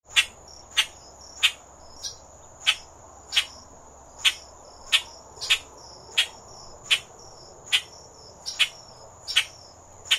common grackle

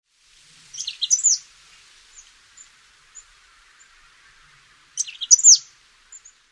boreal chickadee

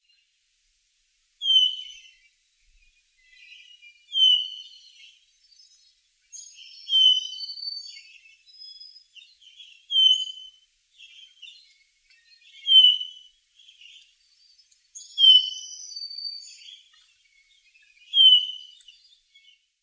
hermit thrush

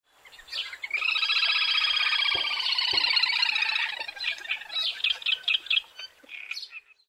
little grebe

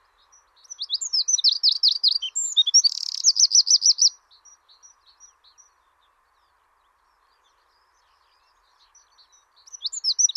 wren

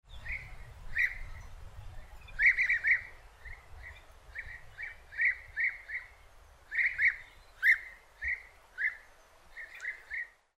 bee eater

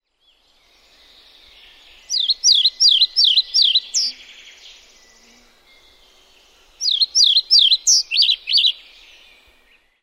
pied flycatcher

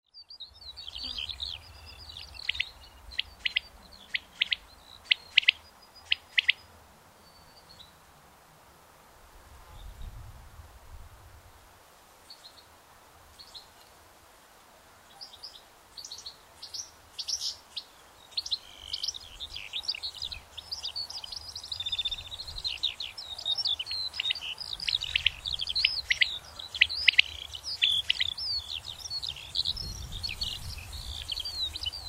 quail

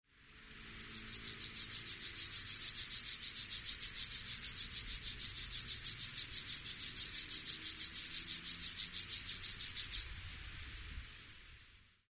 heath grasshopper

